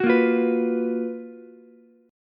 Gb Major 11th, Ukulele C6; strummed; JGuitar

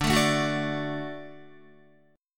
D Major D down Guitar; D strummed; JGuitar

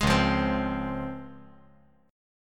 C sharp Major 7th C sharp

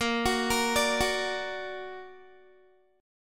Bb Augmented Bb, Guitar; arpeggiated; 18,21,20,19,18,x

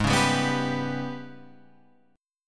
G sharp Minor Major 7th Double Flat 5th

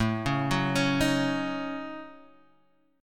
A 6th Flat 5th, guitar; A6b5; arpeggiated; JGuitar

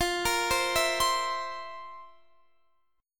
F Major 7th Suspended 4th, Guitar, Drop D

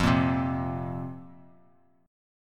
F Suspended 4th, down; strummed; JGuitar

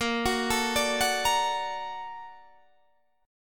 Bb Augmented Major 7th Bb, Guitar Standard; Bb+M7 arpeggiated; JGuitar

